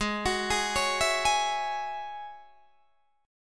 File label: G sharp 7th Suspended 4th Sharp 5th G sharp, Guitar Open D